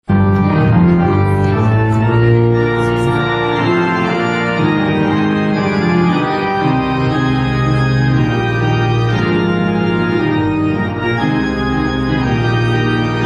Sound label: organ prelude; psalm 68